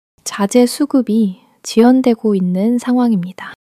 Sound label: struggle